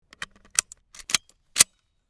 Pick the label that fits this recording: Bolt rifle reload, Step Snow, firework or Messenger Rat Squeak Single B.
Bolt rifle reload